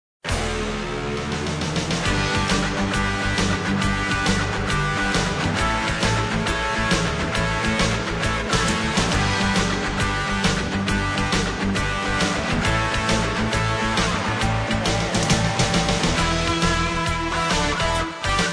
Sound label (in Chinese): 片头音乐